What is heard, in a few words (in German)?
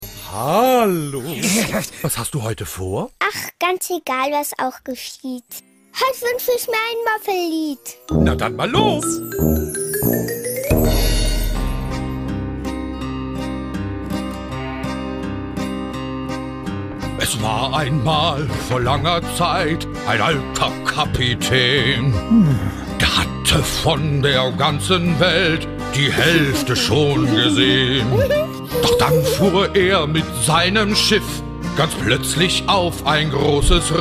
Piratenlied mit Text